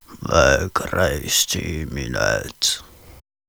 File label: Battle Cry, Viking Vocal, Un-Processed